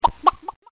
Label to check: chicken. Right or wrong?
Right